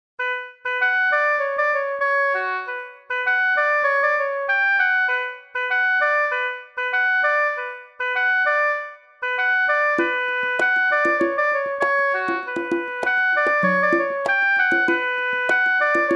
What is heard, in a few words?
oboe; crows nest